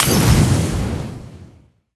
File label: Fire Loop Start; Power Valyrian